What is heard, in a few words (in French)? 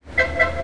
klaxon; Dieppe